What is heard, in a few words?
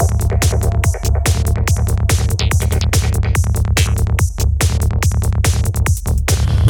A Full On Psychedelic Trance Beat Loop; Sirius